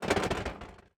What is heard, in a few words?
reload